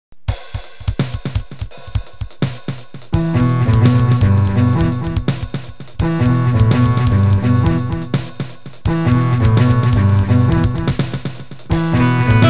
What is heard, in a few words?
ballad